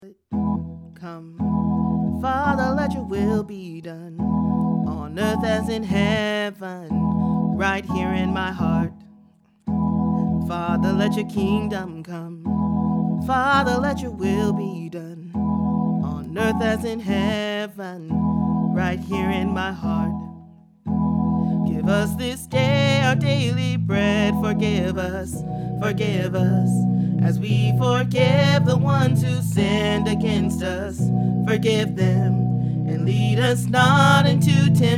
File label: Tenor, choir; 2024 summer choir